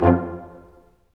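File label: BRS Large Ensemble, Staccatos; Roland LCDP08 Symphony Orchestra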